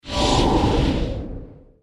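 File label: attack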